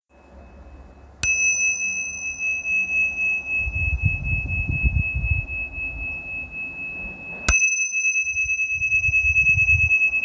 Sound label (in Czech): cinelky bronz male ohm, tibetske cinelky male bronzove ohm